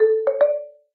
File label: Celesta; Honor; X8b